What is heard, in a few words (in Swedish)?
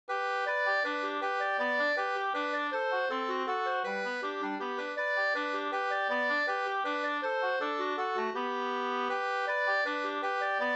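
vals, two-part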